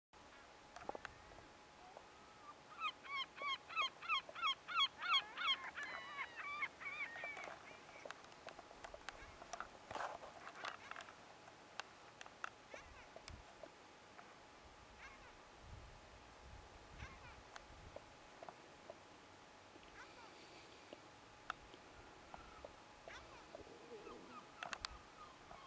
atlantic yellow-legged gull lagoa de fogo ad long call pair bowing call